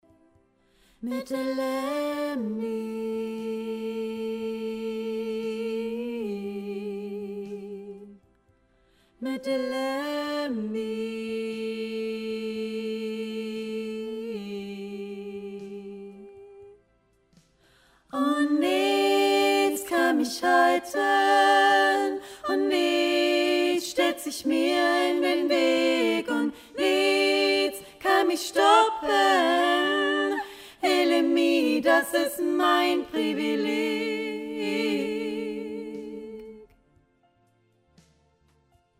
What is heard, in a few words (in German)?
Chorstimmen accapella; Ilemie; Die Musik-Insel; 2. Mittlere Stimme vorn